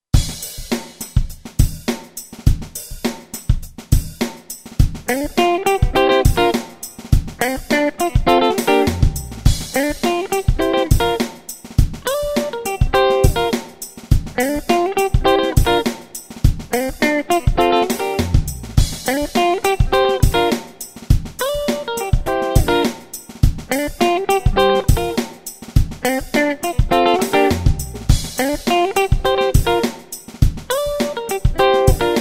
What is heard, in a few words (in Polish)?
rio funk fragment tematu